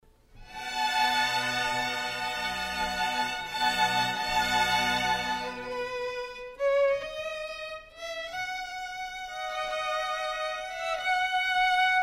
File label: Concerto for 4 Violins